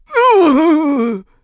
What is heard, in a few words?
Sumo Lose; Sumo match: Bo falling out of the ring